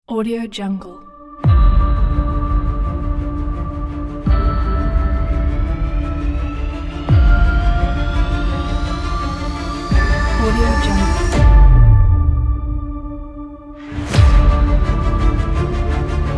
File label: epic battle